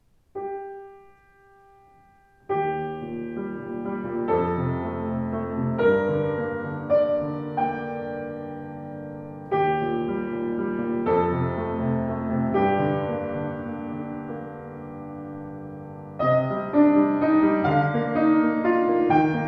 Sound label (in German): Schimmel Buche Klang, Klavier; 115 schimmel buche